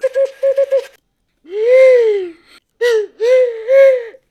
whistle, Cartoon Film Effects

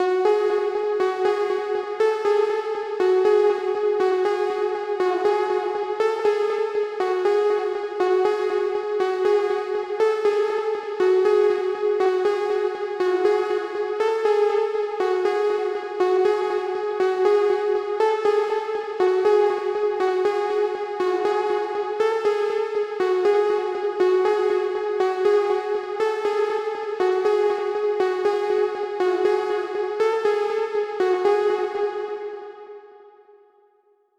120 BPM C# Min Lead, 1980s; Cymatics; GHOST (Beta Pack); Arcade

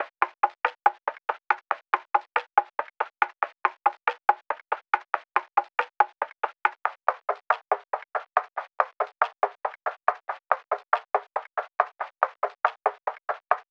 Forest Perc Loop - 140 BPM; Cymatics; Fiji Hihat Loops & Percussion

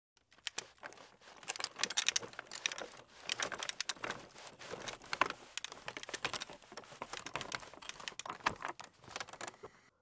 Walker Being Pushed Handling; Fairlight Sound Lib

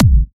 Kick; Ghosthack; Free Male Vocals and Atmospheres; Bonus Drums

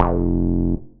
Bass F, techno synth shot; Bass One-Shots; Ghosthack; 45 Free Techno Synth Shots 2025

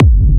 Techno Kick; 30 Techno Kicks 2025; Ghosthack

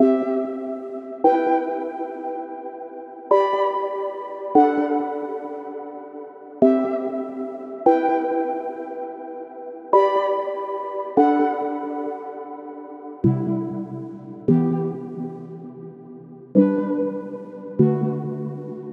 145 BPM E Min Pluck; Loop Stems; Cymatics; Utopia

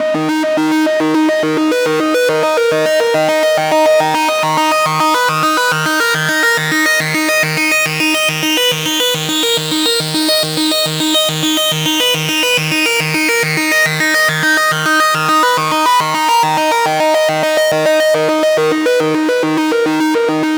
Riddim Melodic Loop - D# 140; Virus; Ghosthack; Riddim Essentials Volume 2

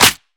Ice Clap, Dubstep; One-Shots; Drums; GhostHack; Ghosthack - AC2024 - Day 13 - Dubstep Sounds 2025

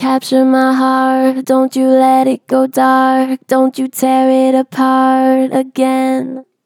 Gentle Flat, Capture my heart, Vocals; Harmor Vocals

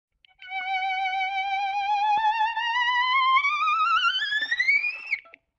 Violin Glissando Slide; Vintage Cartoon; Musical Elements

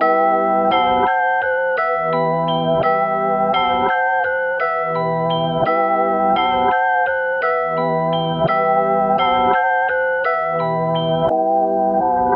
85 BPM E Min, Melody; Cymatics; 2022 Melody Collection Platinum Expansion

